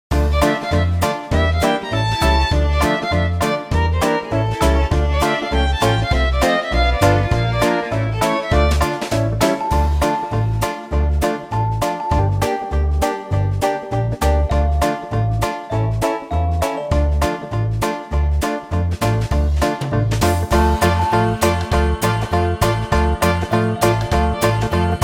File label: karaoke